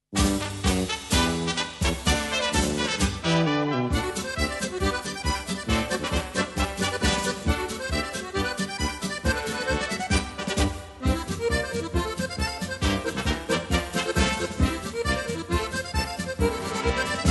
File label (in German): lustige posaune